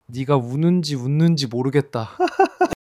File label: laughter